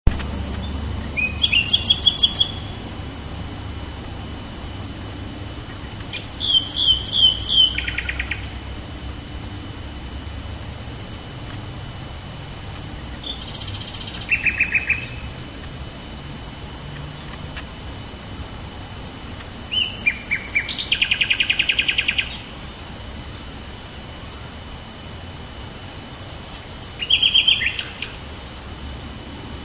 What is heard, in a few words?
Nightbird